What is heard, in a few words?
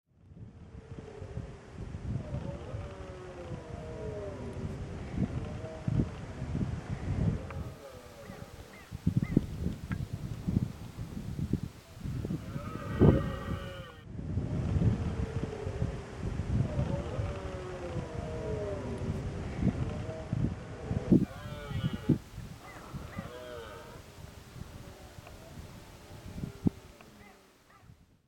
Martindale Rut sounds